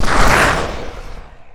death; player

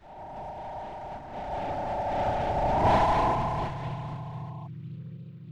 moan

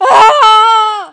death; arachna

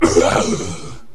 death

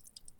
splat

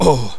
pain; player

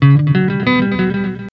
flag return your team

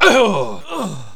death; player

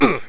pain; assassin

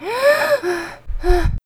gasp; player

